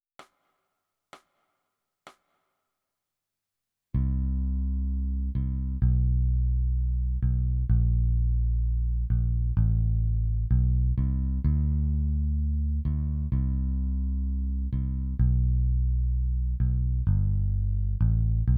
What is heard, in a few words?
Bass